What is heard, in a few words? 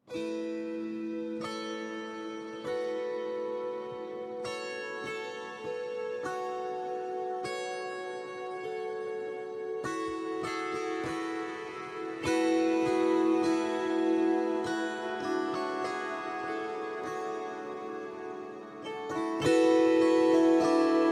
Cloud of Strings